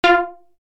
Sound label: Steeldry